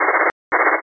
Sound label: wiper